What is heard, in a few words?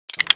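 nagant revolver reload start, weapons foley; Spearhead Demo; MacFormat 2003 June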